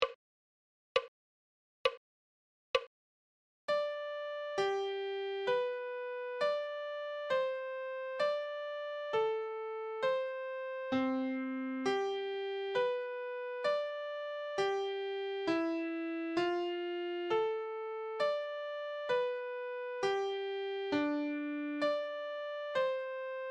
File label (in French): Clé de Sol, Adagio